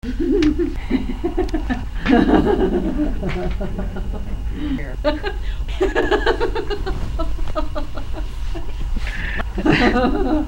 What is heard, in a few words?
laughs